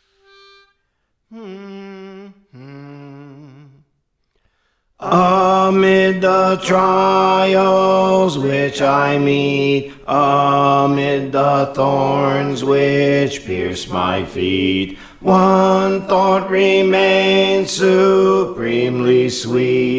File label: Bass; Thou Thinkest Lord of Me; Praise For The Lord Training